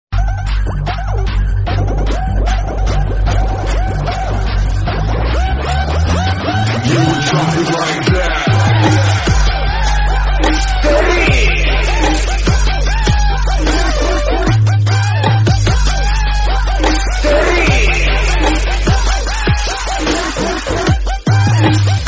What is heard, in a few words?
bgm remix; vedalam; ajith